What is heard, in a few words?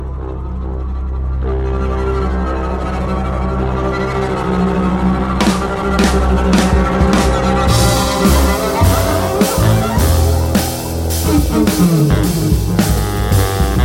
Power Chords